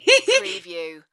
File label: Lady Short Laugh